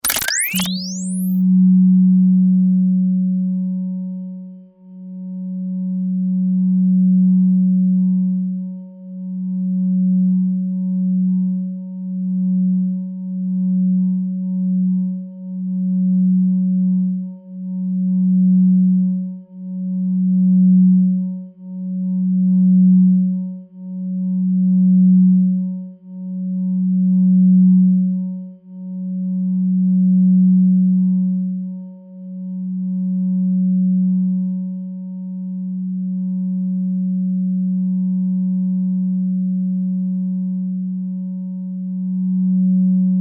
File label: Science Fiction Scanner Switch On Run Off; Tech Sound Effects; Tech (Sound Effects:Science Fiction); SFX Bible 6